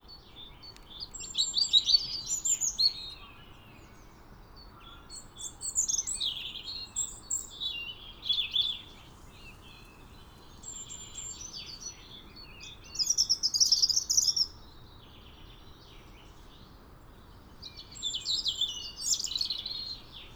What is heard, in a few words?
European Robin Singing; On Thomas Hardys Grave